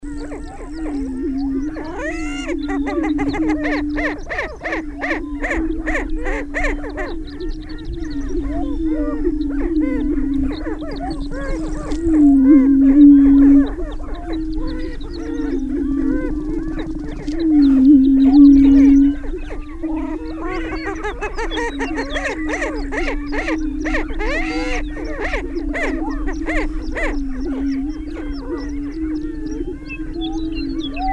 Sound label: Greater Prairie Chicken Booming